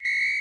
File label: whistle